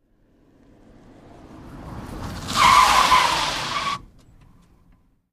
Car Fast Skid, skids autos

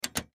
Push Button Plastic

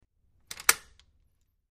Metal Deadbolt Turns; latch, metal

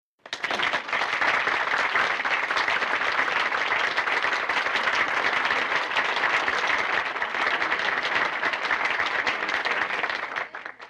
Applause Sml Theater

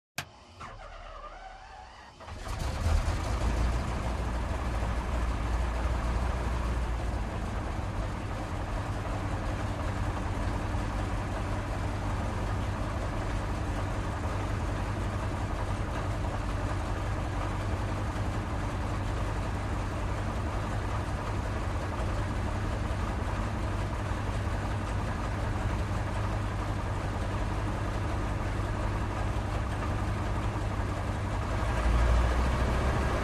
Stearman Electric St

